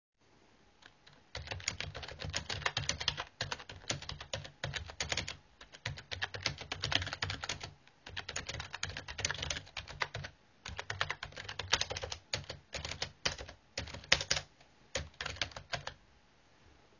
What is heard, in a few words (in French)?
son clavier membrane